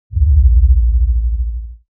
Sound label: sub impact roll